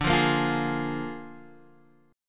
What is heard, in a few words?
Bb 7th D, chord